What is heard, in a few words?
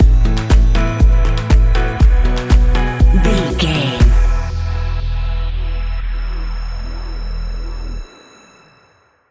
enjoyable rhythm